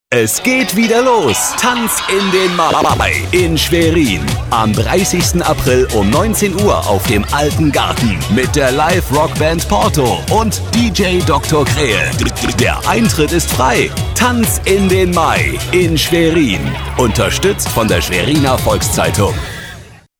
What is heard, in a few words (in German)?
tanz